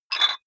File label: dumbbell pull up rattle soft, fitnessstudio